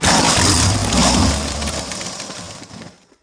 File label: salamander die; DAoC; Toa; Gamestar